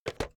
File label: tazer Pickup; Apotheorasis